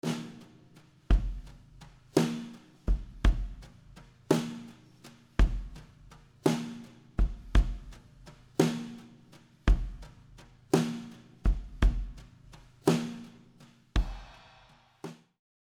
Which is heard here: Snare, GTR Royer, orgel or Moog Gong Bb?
Snare